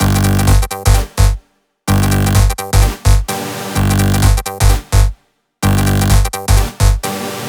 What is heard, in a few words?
128BPM Melody; Vengeance Samples; VFH1 128BPM Machine Kit